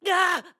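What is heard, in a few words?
Hurt Lava, voice; Link getting hurt by lava; BOTW; Breath of the Wild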